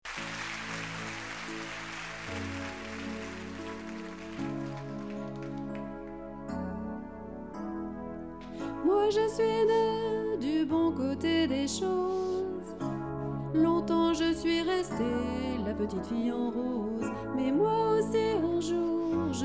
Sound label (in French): Coup de foudre instrumental; chorale